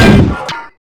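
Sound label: grenade; ParoxysmII; weapons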